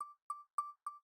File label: Notification